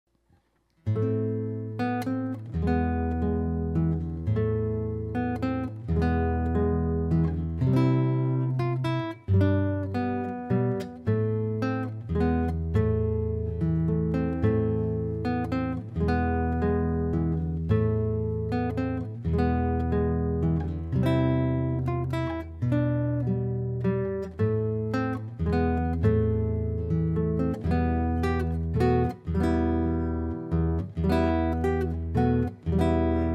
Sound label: Classical Guitar; weddingmusicplanner